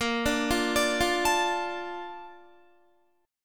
A sharp Major 7th, Guitar; arpeggiated

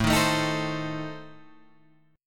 A Diminished A, Guitar Open G; chord

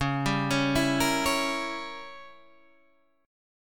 A sharp Diminished 7th

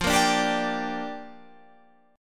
G Minor 7th, F

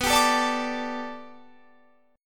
D Suspended 4th B, guitar; Dsus/B; strummed

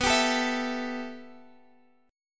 B 7th Suspended 4th Sharp 5th B

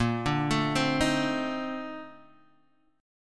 Bb Major 11th, Guitar, Open G

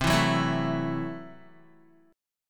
C 6th Flat 5th, C, down, Guitar; strummed; 4F,4A,5C,5G,5C,6E; chord; JGuitar

